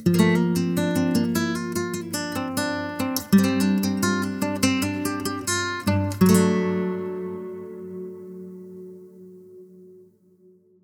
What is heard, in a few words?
Guitar; Yota; Yotaphone-2